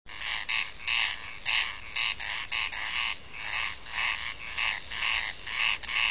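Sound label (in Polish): derkacze, Crex crex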